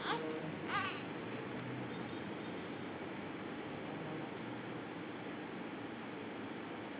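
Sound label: baby hungry, female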